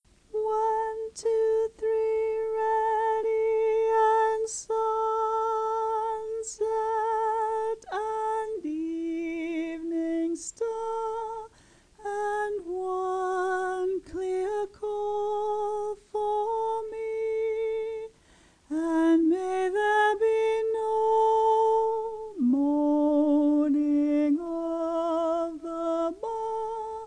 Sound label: Bass, folk choir; Crossing The Bar